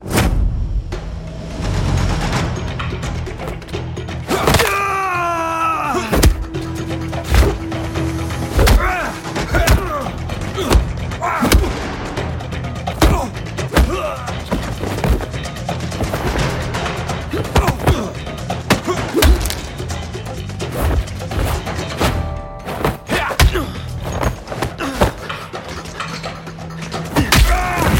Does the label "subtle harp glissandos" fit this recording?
No